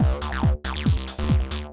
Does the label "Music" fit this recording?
Yes